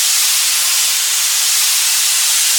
steam loop, machines; ambient; Counter Strike Model Pack; CS:GO

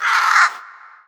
birdbirdbird idle; npc; Portal 2

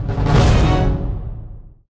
deathcam, music

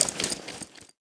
suit, footsteps

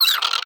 vox hungry growl, creatures, snark

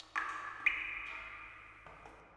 ambient water drips; Portal 2

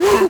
grunt short, zombie vox; creatures

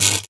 weapon c4 zap impact; physics; weapons

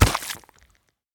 mud impact bullet; physics; surfaces

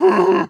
warning, zombie vox; creatures

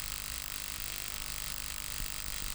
buzz loop, electrics; creatures

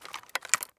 pickup quiet

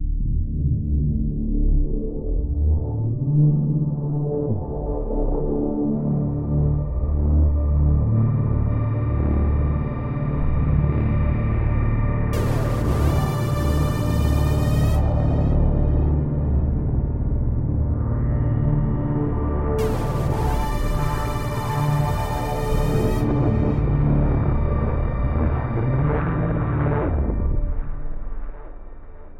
battle intro, music; Half Life Alyx; combine

